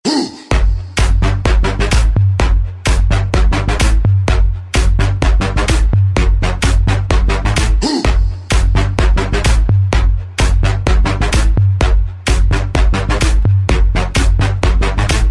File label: Melody